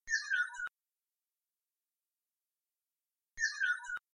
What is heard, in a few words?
oriole call